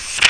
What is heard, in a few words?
book